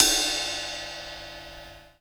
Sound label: crash cymbal sample d sharp key; drum sample